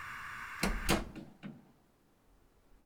コンロの火を消す; lifestyle

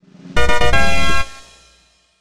ファンファーレ

